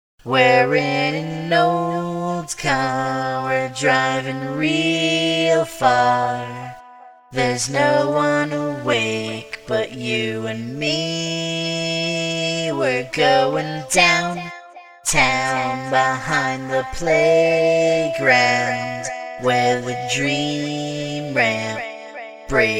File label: acap